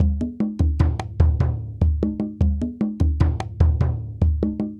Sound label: African Drum 75 bpm; Quazibeat; African Secret; African Drums